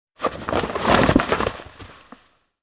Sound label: Tent